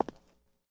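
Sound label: Foot Concrete Shoes Walk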